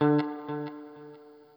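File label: NOODLE SHORT, Guitar & Bass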